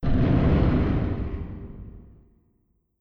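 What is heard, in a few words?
Collision Exterior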